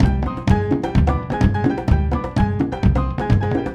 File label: plena